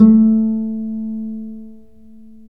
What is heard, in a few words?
STR VC PIZ, Chamber Pizz, String Master Series